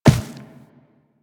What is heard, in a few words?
Walk Dirt, Footsteps; Warframe; Operator